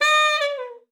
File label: sax d#5, Saxophone slides; Session Instruments; Best Service ProSamples vol.42